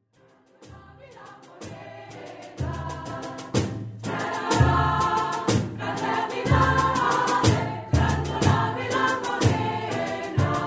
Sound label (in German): Musik Chor; Attac Chor; LoRa